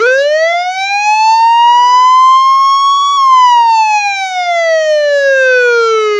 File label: Police Siren; car simulator 2